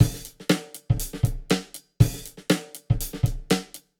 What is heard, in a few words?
120 Great Break, Warped Live Drums; Loops; Touch Loops; CosmicFunk